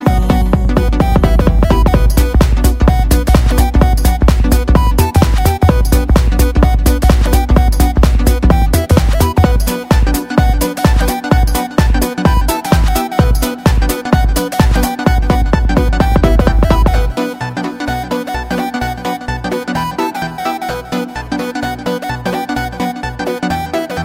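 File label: club ringtone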